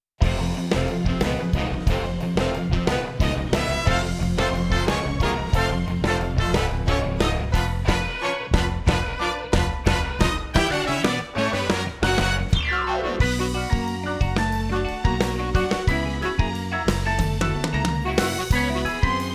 EXIT MUSIC; Addams

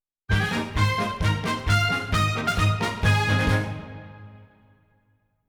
INCIDENTAL MARCH

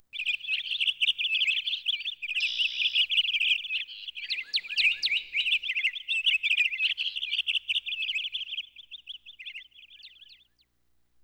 BIRDS SFX; Into The Woods

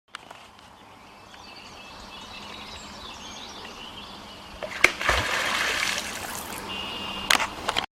Angry Beaver Slaps Its Tail

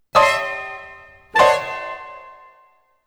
fanfares; Into The Woods

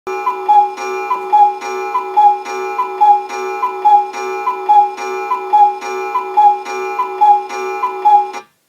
Cuckoo clock sound effect

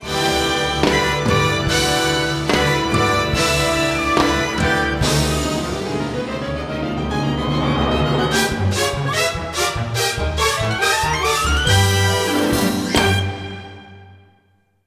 SCENE CHANGE; Beauty and the Beast

